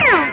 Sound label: Ricochet